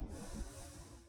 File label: Air Zero, Train; OpenBVE